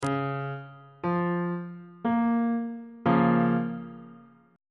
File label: a sharp minor 1st inversion